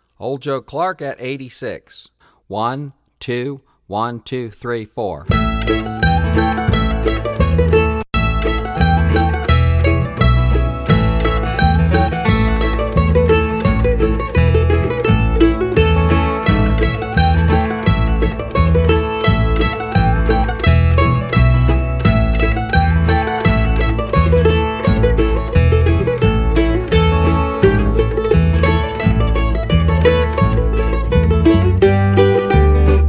Old Joe Clark, 86bpm

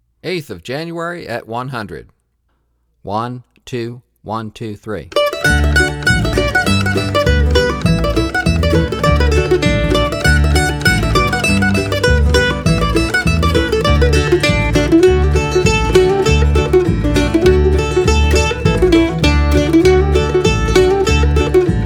8th Of January, 100bpm

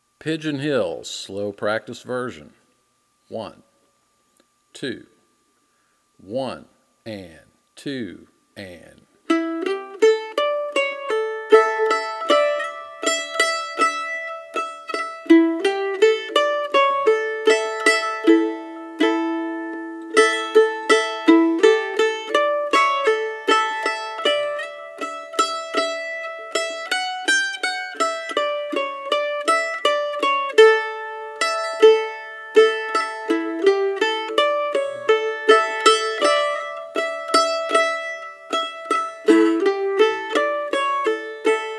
Pigeon Hill Practice Version

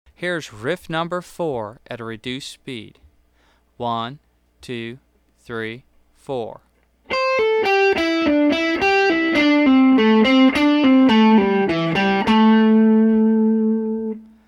Hot Licks Riff

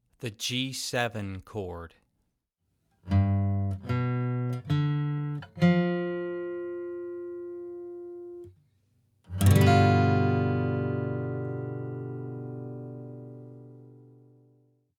G Dominant 7th

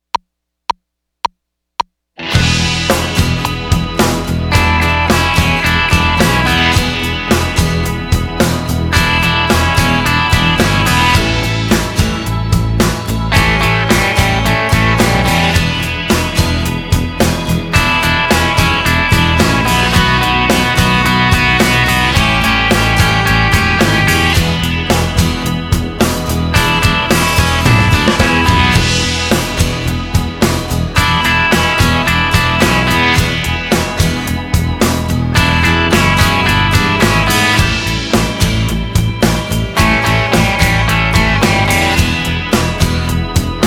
E Blues Double Stops

